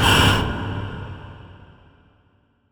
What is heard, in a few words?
airbrake; Sonic Foundry (Sony Creative Software); Ugly Remnants VOL-2; Beatstuff